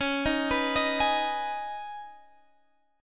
Db 7th Suspended 2nd Db, down, Guitar Standard; JGuitar

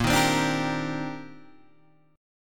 C Minor 9th Bb, Guitar Standard; Cm9/Bb strummed; JGuitar

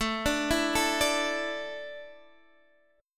A Suspended 4th down Guitar Standard; Asus4; arpeggiated; JGuitar

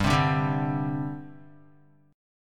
G Suspended 4th, Guitar, Gsus4 chord; strummed; JGuitar

